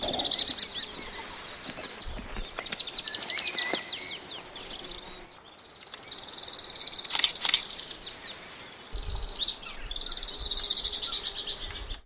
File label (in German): alpensegler